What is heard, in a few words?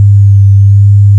Magnet Loaded; Barrack Unity